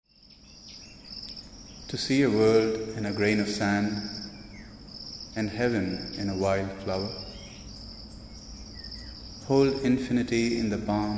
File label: soundscape; eternity in an hour